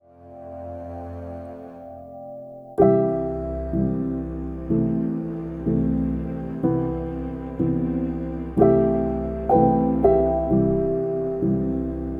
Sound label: ambient cinematic; ambient cinematic vol1